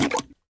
Poop Drop; Ed Edd n Eddy; Adromulus Pack

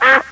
Quack

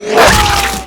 bullet fly headshot

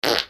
Fart

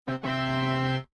Win

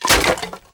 Wood; Ed Edd n Eddy; Adromulus Pack

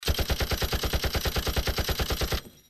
Gunshots Machinegun

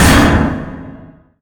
DUM, Suspence sfx

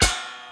Twang; Ed Edd n Eddy; Adromulus Pack; EEnE

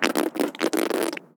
Fart; Ed Edd n Eddy; Adromulus Pack

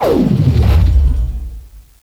bullet time on

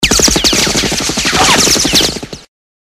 machinegun ricochets, weapons

